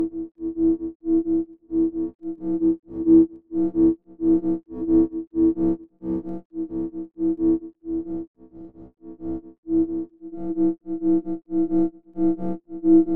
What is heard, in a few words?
alarm rythm sinus